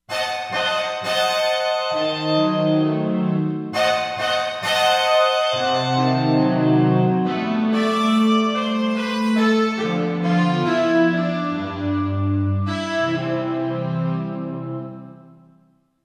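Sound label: ethno brass, Korg; Korg MSC-13S Ethnic 2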